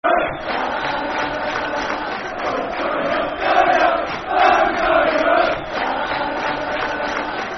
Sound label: Football Chant, Soccer Song; Boro's Going Up; Peterborough United Pufc